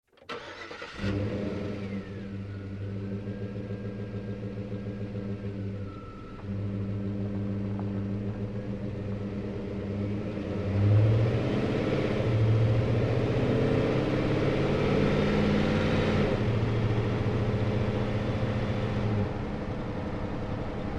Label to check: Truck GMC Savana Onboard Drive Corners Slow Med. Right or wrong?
Right